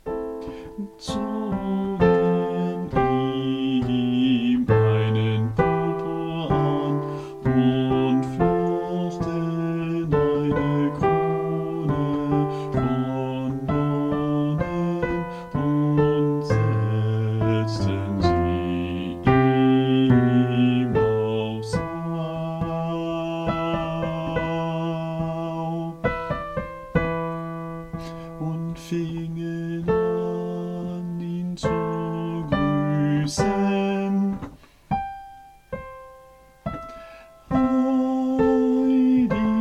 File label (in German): Zogen ihm dort Bass